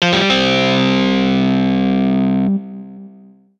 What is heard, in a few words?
Ui Mission Complete